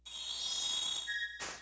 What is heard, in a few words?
new stage; nsmb